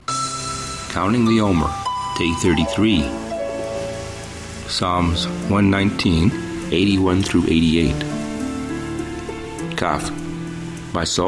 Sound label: Meditative w Waves Ocean; Omer; Psa119